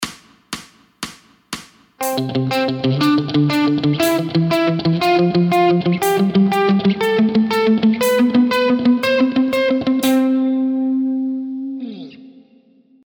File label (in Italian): Intervalli di Ottava